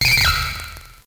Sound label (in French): Cri